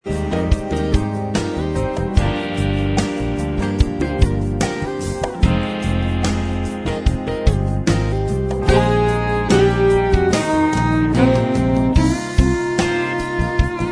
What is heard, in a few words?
Inst Track